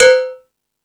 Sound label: Percussion, Drum Machines E-MU Pro-Cussion